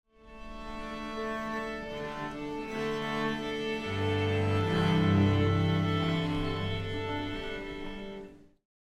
Orchestra Tuning Ambience - Short Symphonic - Musical; Hollywood Edge; sound designer's toolkit v2